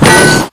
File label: bolt loose; Titanfall 2